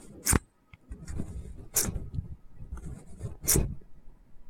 phone tripod squealing, high-pitched sound of a phone tripod squealing